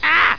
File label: plyr jmp